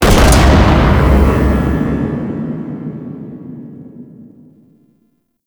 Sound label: Galleon Gun Fire; Warframe; Ambience; Grineer Galleon; Galleon Props